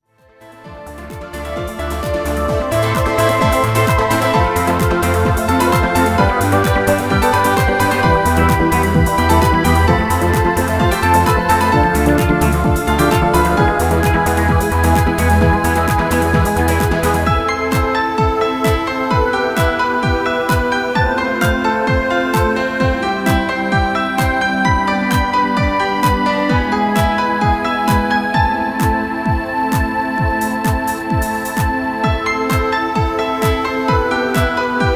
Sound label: BattleShip Remix C64; bricolé 2 heures